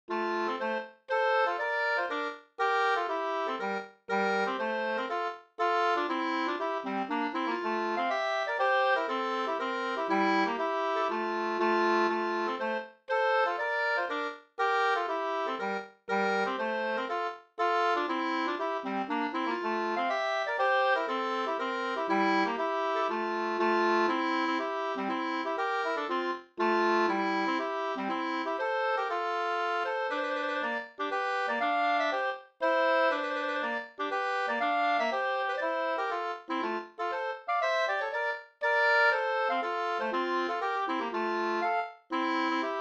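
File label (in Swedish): polska, tvåstämmig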